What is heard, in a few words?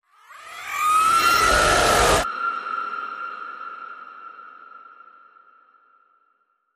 Shocking Scream